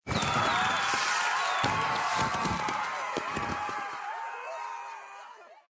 big win into

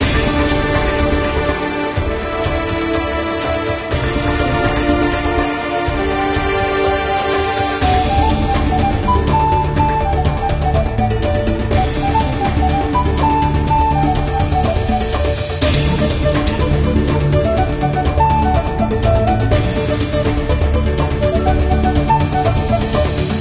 bigwin loop

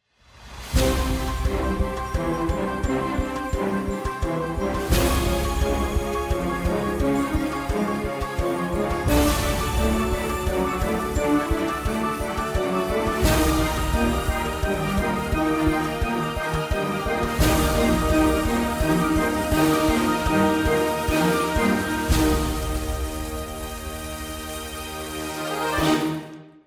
Win

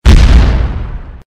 boom

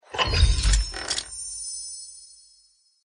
open box

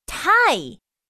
Win

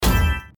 scatter drop

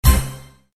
spin

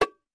spin

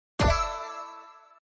bonus win

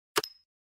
start

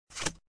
flip copy card

